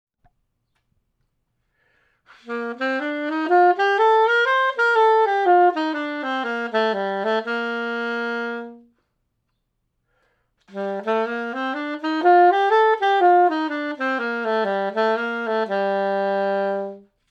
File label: major minor scales